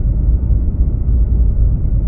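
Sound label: conference ambience; trek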